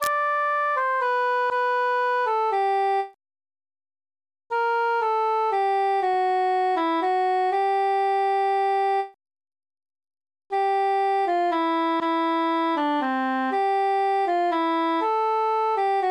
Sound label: cantus solo; follow me sweet love